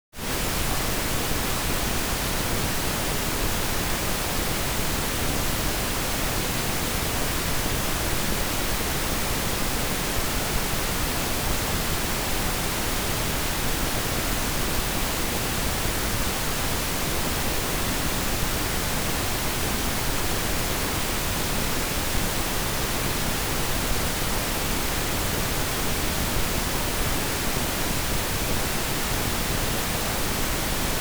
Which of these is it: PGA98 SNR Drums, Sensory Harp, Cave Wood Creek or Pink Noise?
Pink Noise